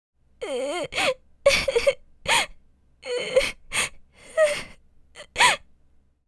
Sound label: Crying anime girl vocalization